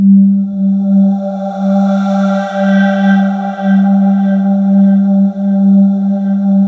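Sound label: atmosphere; Sound Gallery - Charged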